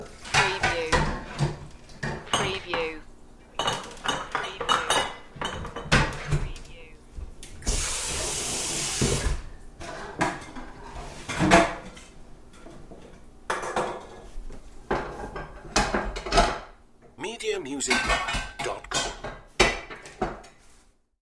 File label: Wash Dishes